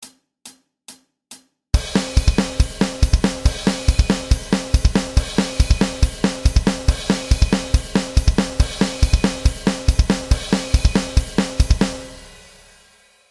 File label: thrash, bass